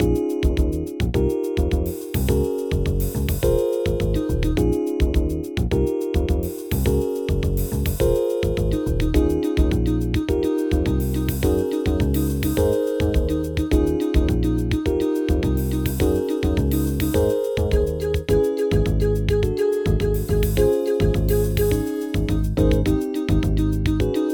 Samba midi

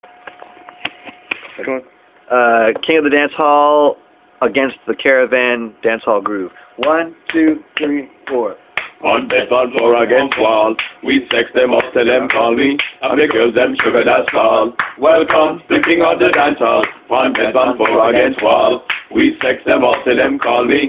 Vocal Rehearsal chorus

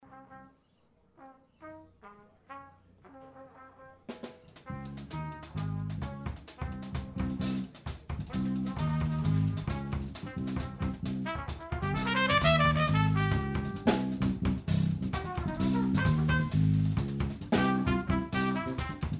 Latin Jazz Trio

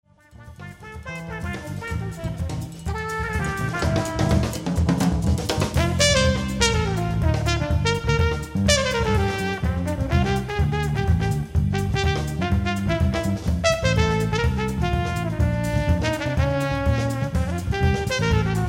Latin trio

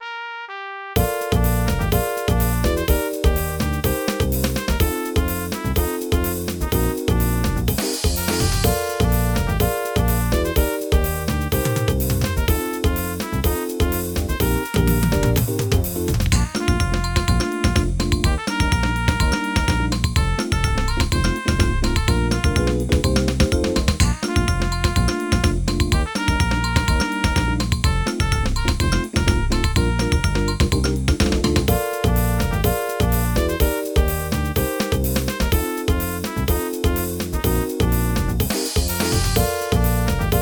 midi

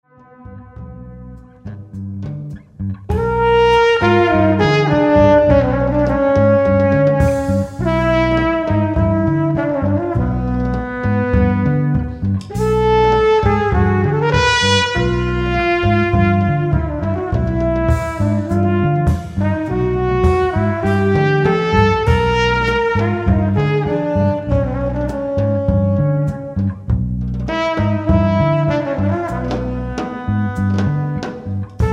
Acoustic Trio; Estonia

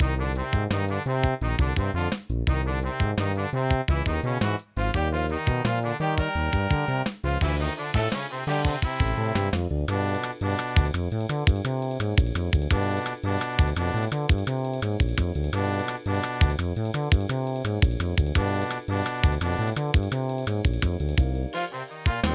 With Bass

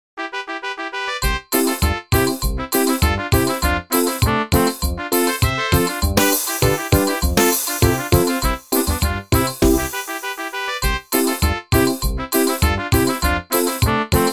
Cumbia; Peace Theatre

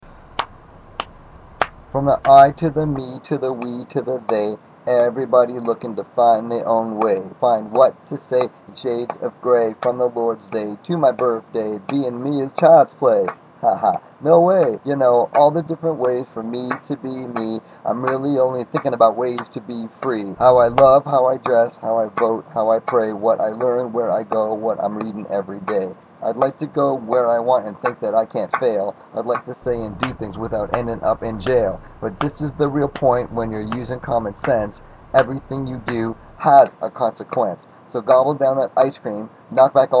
Closing Song Verse Freedom Rap; Peace Theatre

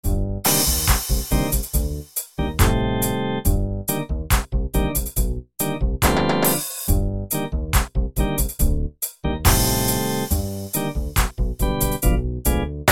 Reggae; Peace Theatre

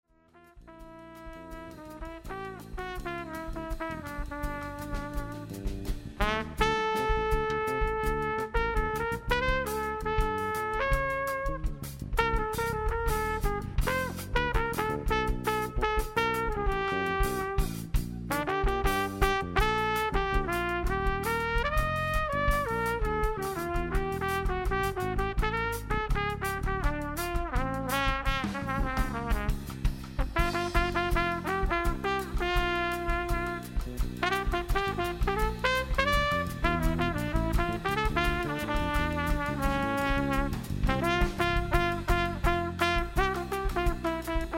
Latin Trio

